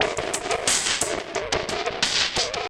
STATICUS; Distored Reality

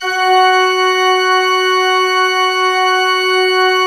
F3, Cathedral Organ

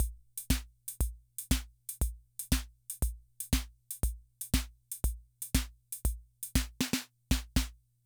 Loop, rhythm 55, classic machines

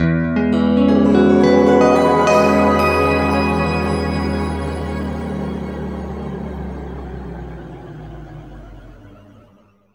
E, guitar chords; East West; Steve Stevens Guitar Samples Collection

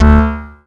D.B. Bass One Shot (D)

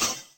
FX, Cymbal, Yamaha DTXpress; drum machine

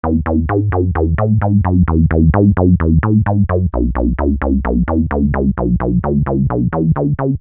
Syn-Bass m E, Techno Synth Loops, 130bpm; Sonic Foundry (Sony Creative Software); Signals I

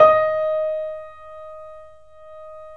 3D Pianos Yamaha C7; E-MU Producer Series Vol. 5

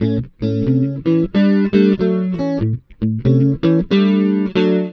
BLUES, Acoustic Guitar Loops

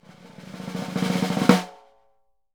SNR, Synclavier Percussion 2 - World & Orchestral

